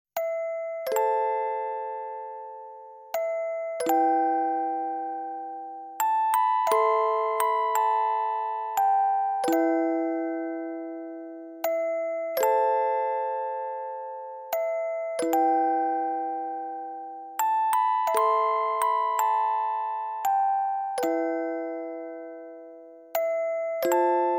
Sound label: memory of music box; Speed Grapher